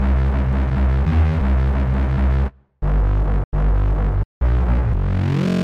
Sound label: Jungle Bass in C